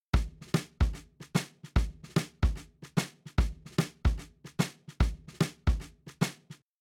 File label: Drums, shuffle drums; WDR2